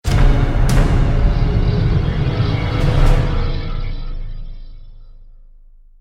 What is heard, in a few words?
Stinger Action Medium; Naughty Bear Panic in Paradise; PS3; Xbox 360